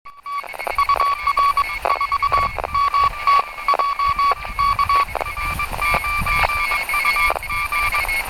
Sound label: PILEUP RX DSB